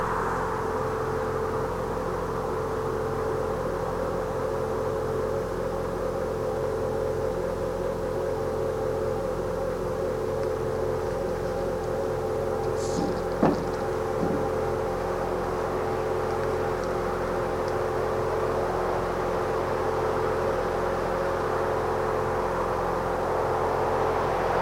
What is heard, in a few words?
wooshie